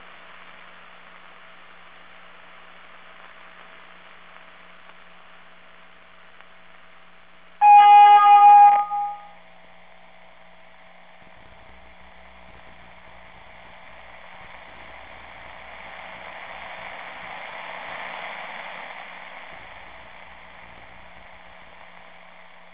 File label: sound & music